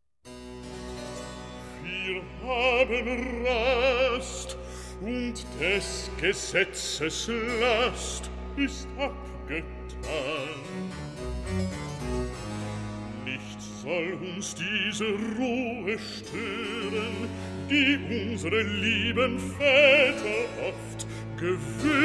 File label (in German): recitativo b; freue dich erloeste schar; bwv 30; wir haben rast